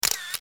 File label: camera capture